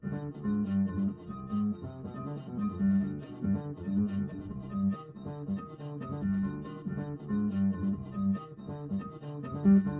wdrums; SiSEC10; underdetermined

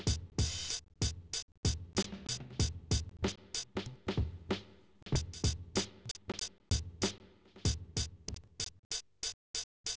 wdrums inst sim; SASSEC07

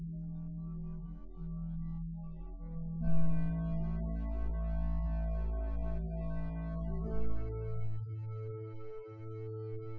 wdrums synthconv 250ms 5cm; SiSEC08; SiSEC underdetermined

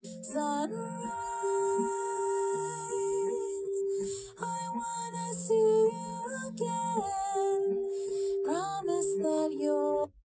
drums; SiSEC11; underdetermined

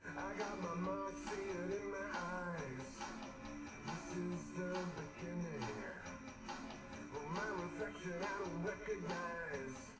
wdrums liverec; underdetermined; SiSEC11

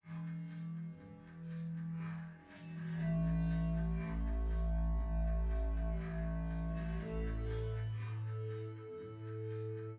wdrums

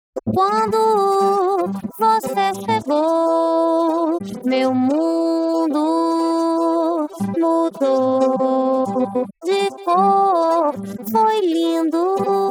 vocals; SiSEC11

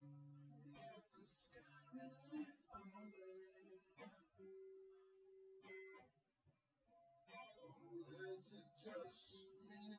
nodrums synthconv 250ms 5cm sim; SiSEC08; SiSEC underdetermined

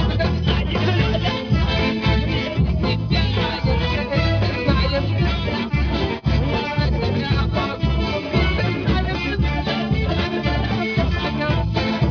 music

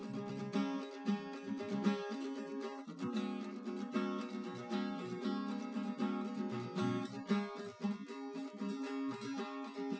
nodrums inst sim; SiSEC08; SiSEC underdetermined

